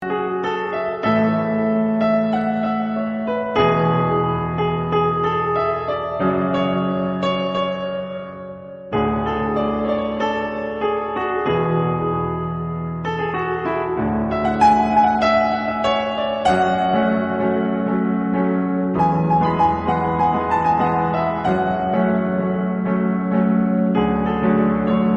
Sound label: Instrumental Ringtone